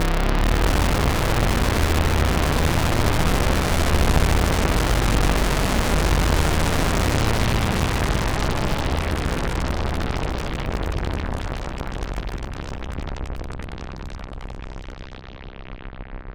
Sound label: FX, Roland MKS-80